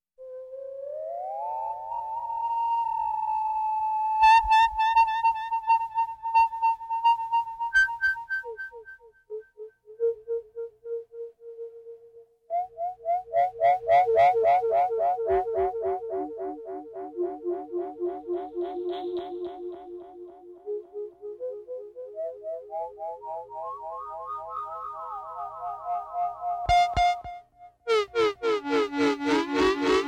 Interstellar Horror Ambience

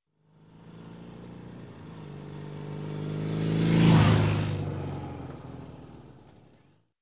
Motorbike passing by

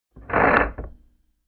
Machine Engine Gear Creak

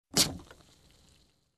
Crushing Ingredients on Mortar and Pestle

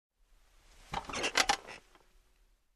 Crackling Seat

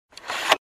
Inserting film on a projector

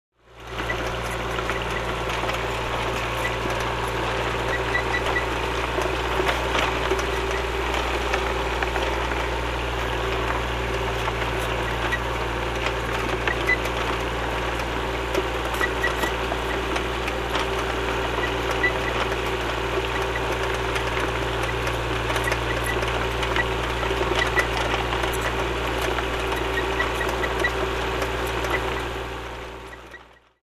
Front Loaders Running

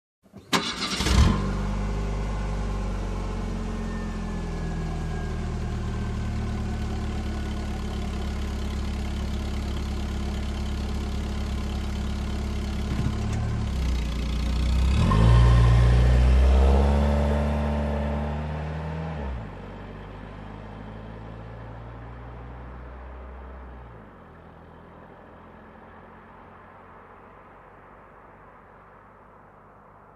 Car engine start and drive away

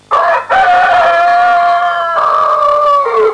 Rooster sound short

